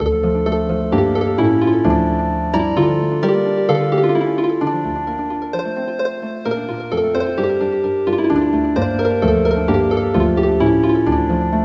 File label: Healin Harp